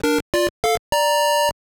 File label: jingle; Welcome Nakayoshi Park; gb